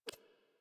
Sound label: UI Default Theme Focus; Warframe